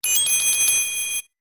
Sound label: Fairy Sound; Zelda